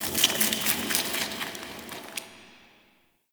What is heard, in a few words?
Under City Insect Wet Scurry